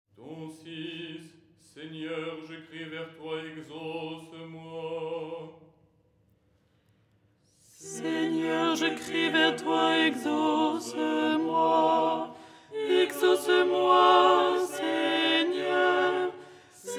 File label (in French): alto; " Seigneur je crie vers toi… "; Les 8 tons en français; ton 6